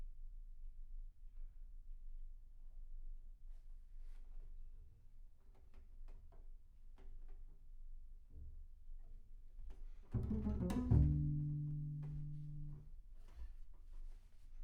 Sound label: Bass Room